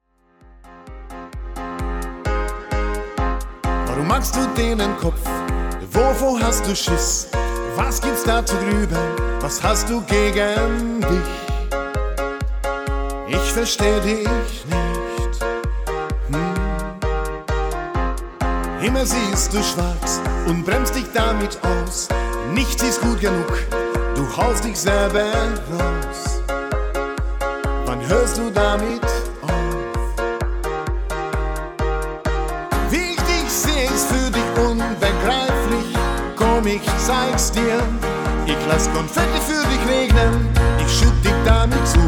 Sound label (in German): LIVE GESUNGEN Choere